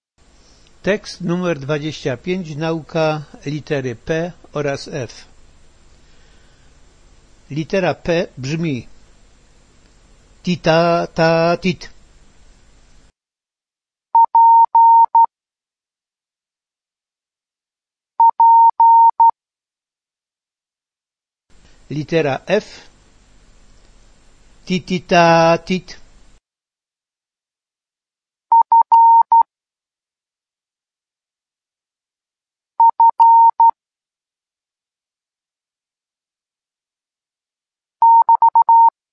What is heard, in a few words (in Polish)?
Tekst P F, kod morsea; Nauka liter P F; Melodia litery: P ti-taaa-taaa-tit / F ti-ti-taaa-tit